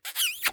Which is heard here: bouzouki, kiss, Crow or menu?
kiss